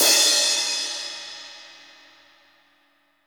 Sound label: CRASH, OLD ZIL CRS